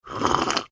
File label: human drink slurp from cup; Person Drinks / Slurps From Cup Or Glass; Slurping